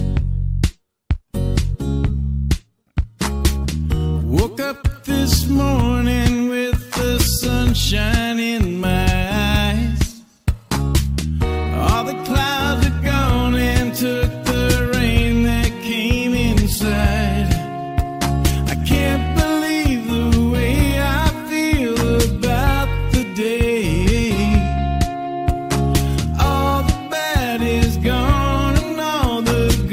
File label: Ringtone